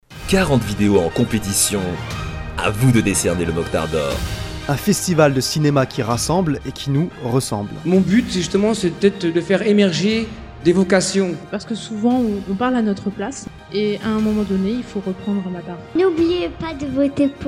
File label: jingle